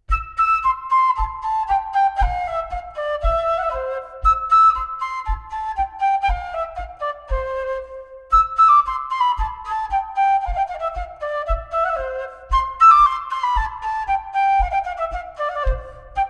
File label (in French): Bourree; Croisez